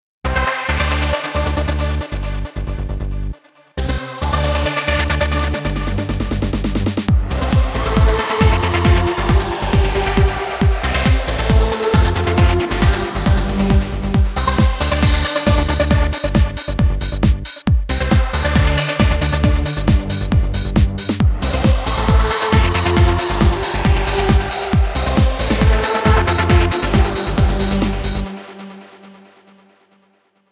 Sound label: Trance Up; ToneRack